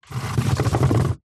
household chair patio plastic drag wooden decking

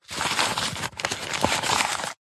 wallet leather rummage receipts; foley; zapsplat

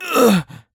Male Shout of Pain; Screams Shouts 2; soundbits

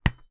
sport football kick or punch

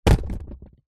food watermelon roll away downhill; zapsplat

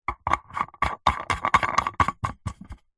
household lightbulb screw in plastic lamp; zapsplat

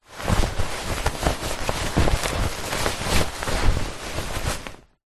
foley clothing suit trousers pants handling

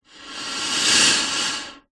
human blowing air into plastic tube

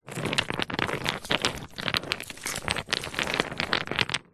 foley stones medium sized movements; zapsplat

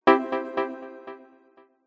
multimedia game sound digital stab attention

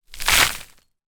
foley soil dry shovel load throw tip ground; zapsplat

